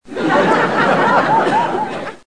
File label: sfx audience laff; GOV. SCHWARZENEGGER